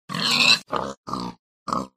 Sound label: minecraft pig